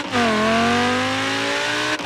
second, vehicles, audi v10; autos; skyautos

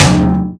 cannon ball impact; weapons; loose cannon; garrysmod; tf2

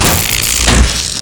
sentry wire connect, weapons; tf2

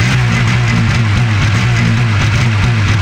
gun motor loop dry; weapons; dragon; garrysmod; tf2

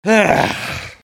negative vocalization; demoman; Garry's Mod; tf2 content

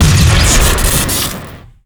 reserve shooter crit; tf2

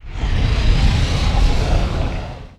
hell rumbles; ambient, hell; Garry's Mod; tf2

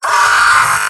medic pain severe; vo; tf2; mvm

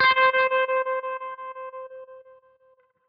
class menu, music; Garry's Mod; tf2

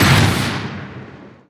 giant step; giant common; Garry's Mod; tf2; mvm

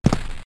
walk step, footsteps; vinagre npcpack

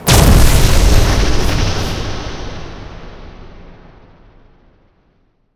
tower explode; skbt famale agent; astro glitch

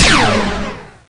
laser shot; hector; skibidi; the monster plane

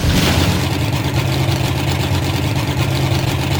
rev, truck; brrr truck; sentry; manster

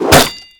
arrow impact concrete; weapons

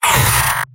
soldier pain sharp; vo; tf2; mvm

